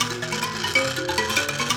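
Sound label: PLK Bass Mbira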